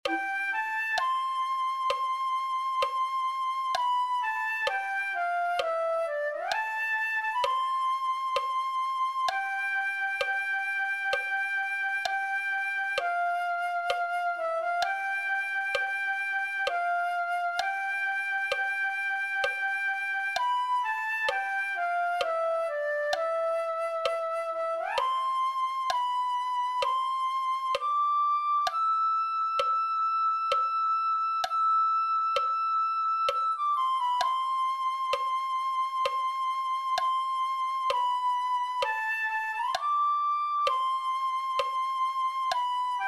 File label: flute